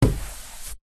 household brush hand held sweep internal hollow wall single stroke; zapsplat